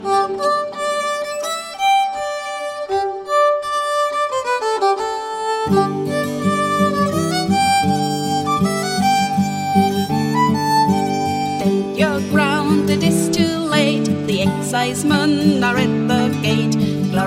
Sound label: Hills of Connemara